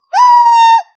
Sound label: ghostly owl shriek wings